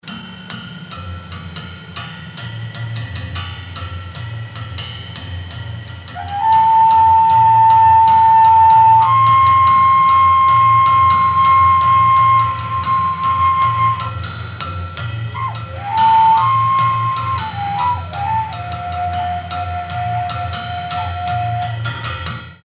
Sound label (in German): obertonfloete